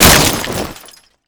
break

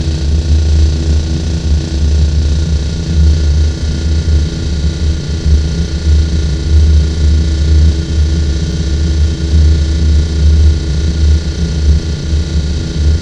ambience energy

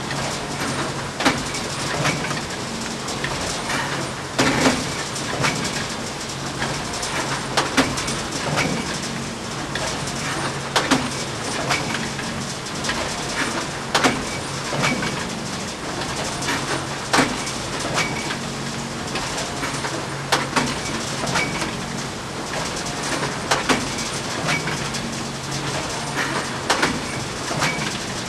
ambience

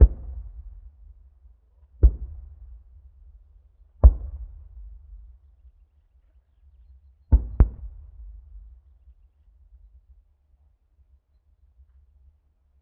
ambience far boom

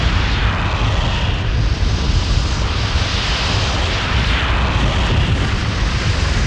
ambience

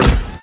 player step

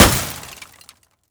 crash

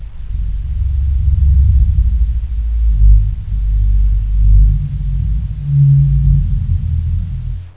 rocket groan

